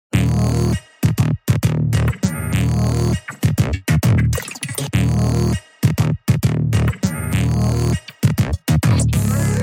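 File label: bass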